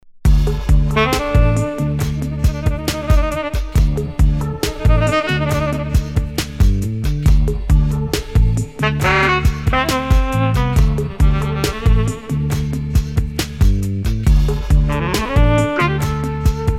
Tenor Sax Crazy Lounge

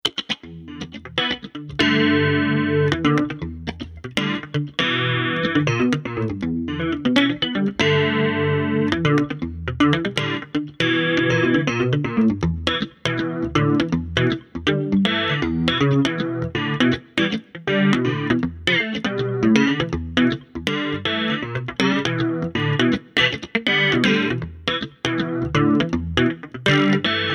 Funky Jazz Chorus Solo, Jazz Guitar

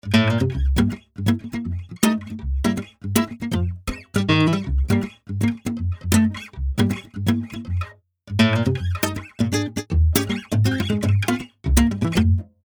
Strum, JazzGuitar

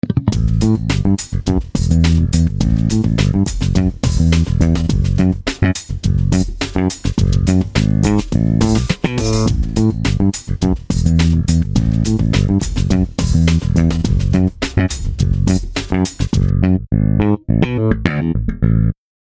Fretless unusual

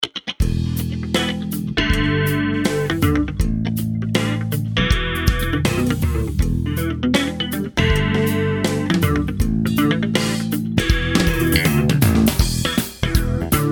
Funky Jazz Chorus, Jazz Guitar

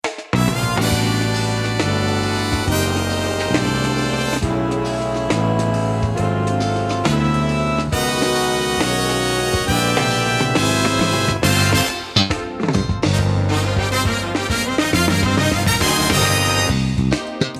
Horn Funk

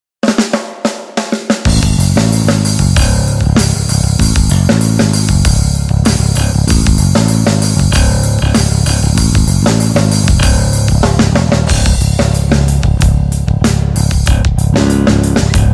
E-Bass Picked Nu Metal

